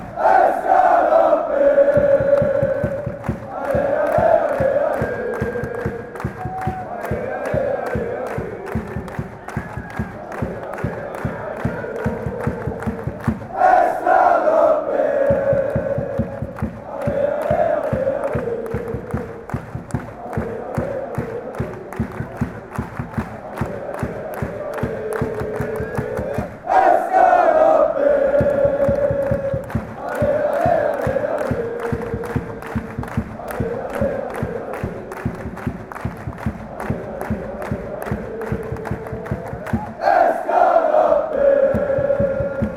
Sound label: chants; sk rapid ale ale ale ale